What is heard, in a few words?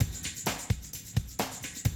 130, Classic DrumLoops